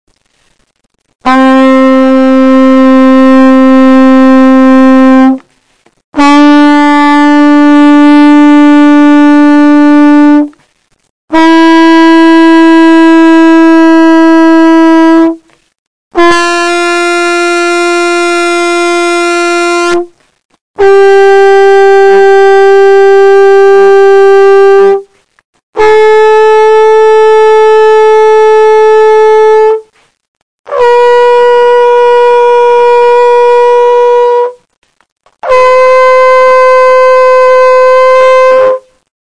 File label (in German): fluegelhorn, ton a; Flügelhorn